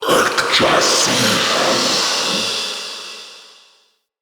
Mockery Male Vocal Enemy Spotted; Mockeries

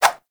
Spheres Throw Whoosh; Warframe; Weapons; Solstice Spheres

